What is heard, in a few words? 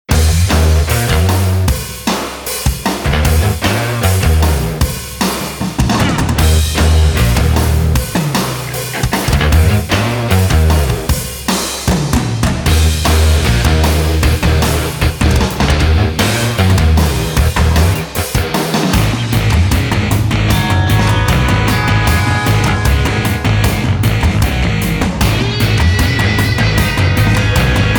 Boogie